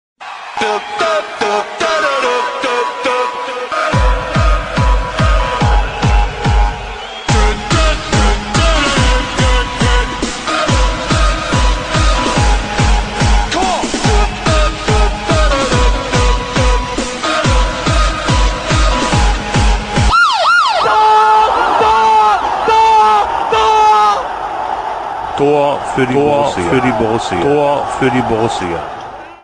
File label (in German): Torhymne; Gladbach